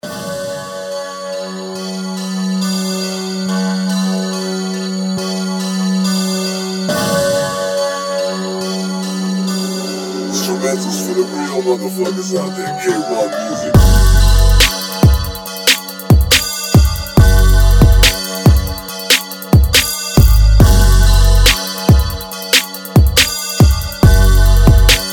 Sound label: Trap